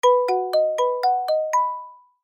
Kalimba, ringtone; Samsung; Galaxy Watch 6

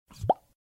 Unlock; Qiku Luna-Youth

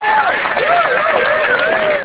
Crowd roar; Audiovox; CDM-9200

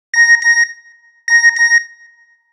Message, Nokia Alerts; Windows Phone apps

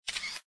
camera click; Infinix XOS 10

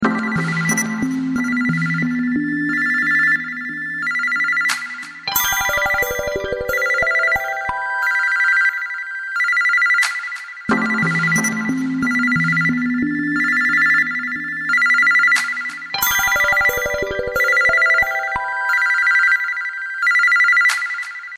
Morning Bird; LG; VX8575 Chocolate Touch

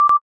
camera focus, ui; General Mobile; GM6

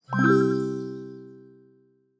decorative celebration; Google; Material Design sounds; Hero Sounds

